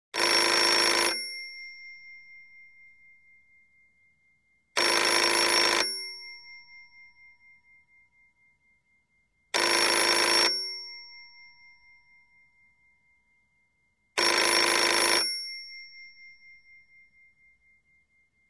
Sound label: Telephone, European Ringing, Foreign, Office; Sound Ideas; Series 2000